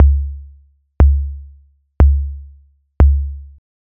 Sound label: bass drum kick; ableton live